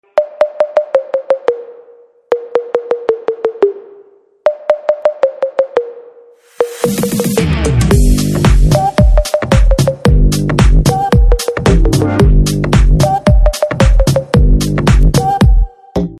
BGM Ringtone

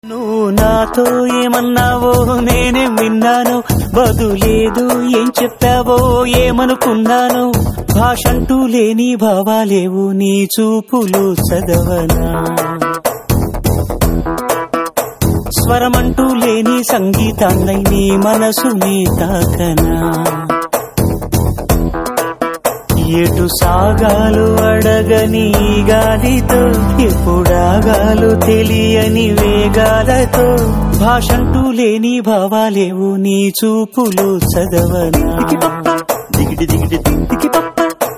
Telugu Ringtones and BGM; Disco Raja

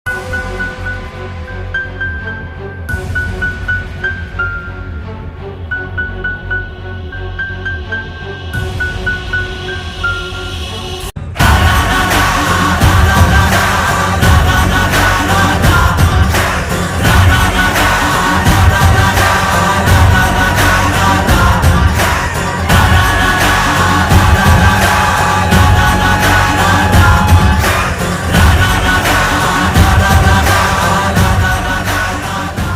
Instrumental Theme Song; Tanhaji Movie

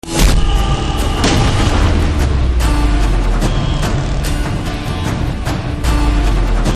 Teaser BGM Ringtone

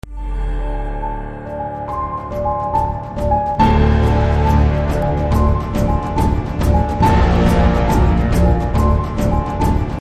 teaser bgm ringtone; drushyam 2

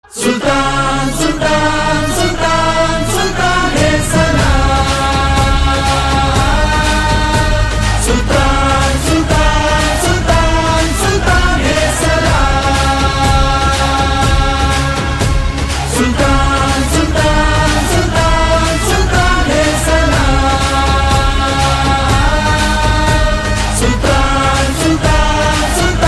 Title Song Telugu Ringtone; Prabhas

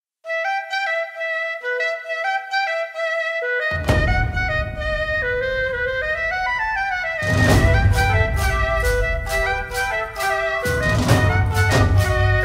Instrumental; Atrangi Re Movie

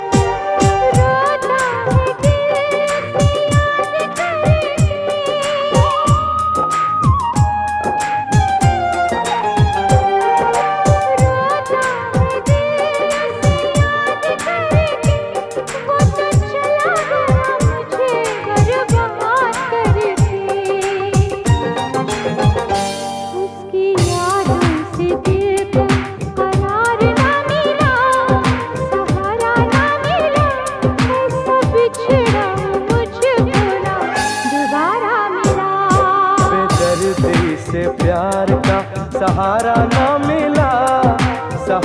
Female version Song

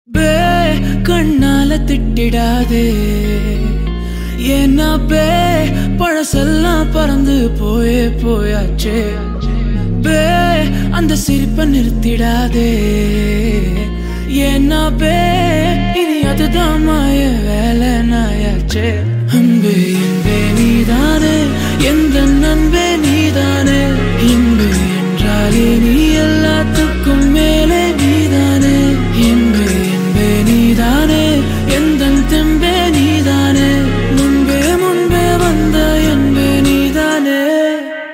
Song BGM Ringtone

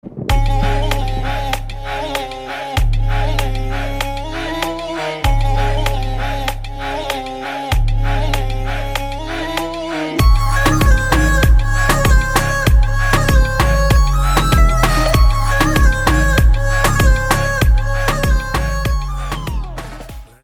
Instrumental Ringtone

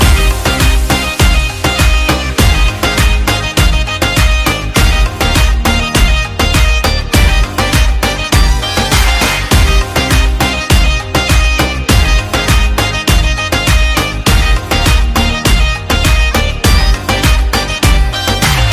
Instrumental Tone; Satyameva Jayate 2

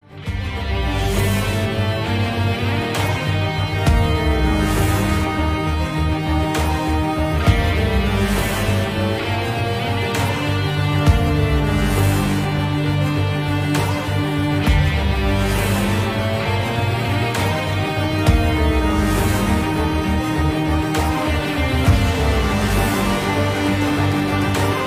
Short Version Intro Theme BGM; Breathe Into the shadows

